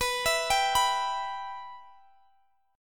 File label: B Suspended 4th Sharp 5th, Guitar, Open G; x,x,21,21,20,21